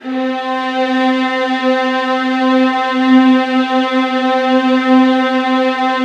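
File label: VIOLINS D4; ILIO; Synclavier Strings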